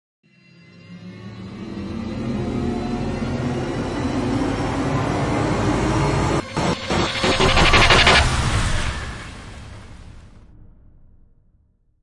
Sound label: Radiance Rise Only; Ninja Tracks